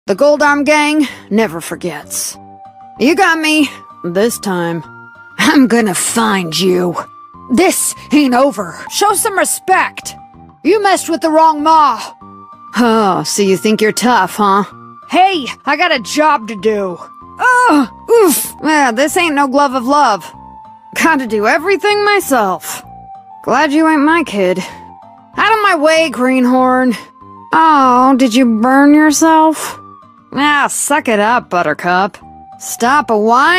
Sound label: Bell